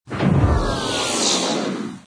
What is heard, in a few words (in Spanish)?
trampa; Source Engine; deathrun starwars